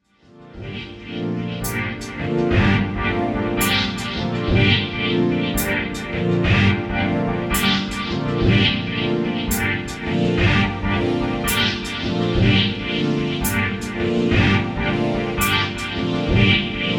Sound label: dub harmonics